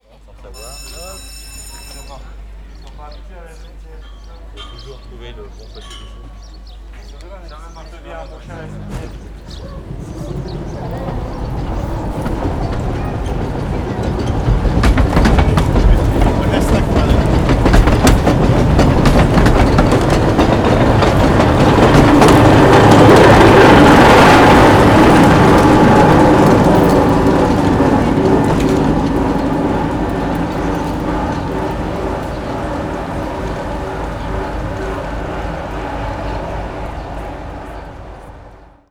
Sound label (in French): petit train de La Rhune